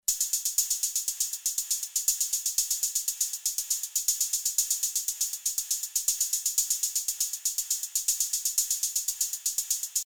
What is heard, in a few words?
HH Pattern